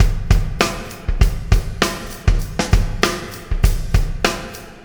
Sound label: Beat, Processed Drumkits